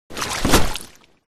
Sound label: Lilo inflatable mattress thrown into water